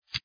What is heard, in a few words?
SEND CARD